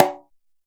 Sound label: Conga Slap